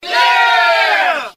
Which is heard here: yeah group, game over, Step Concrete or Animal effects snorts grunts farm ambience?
yeah group